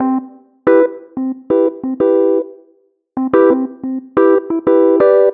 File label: Funky EP, keys